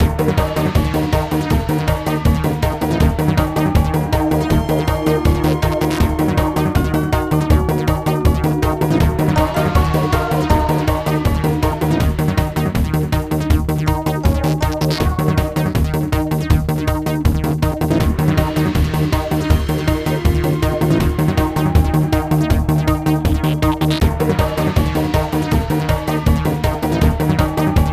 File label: tension; the warriors 2005 ps2; huns tension